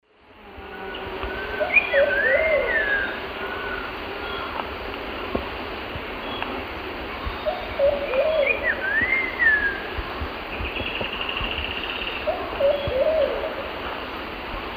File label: Saltator grossus, call; S. America; Eastern Lowlands